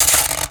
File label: spark; Portal 2